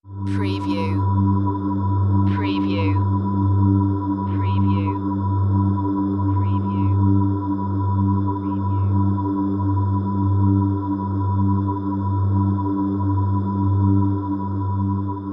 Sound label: Noizy Vox Dark